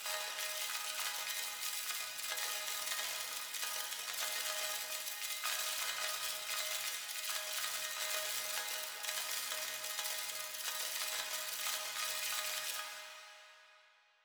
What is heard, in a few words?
silly g sharp minor; M8; random and out of place